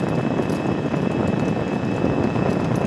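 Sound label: robot idle loop; npc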